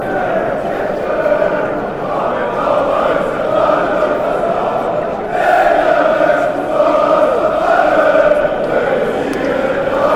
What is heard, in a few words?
ole fc schalke, fanchants